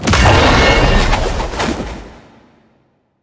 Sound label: activate; Smite; god Kuzenbo v2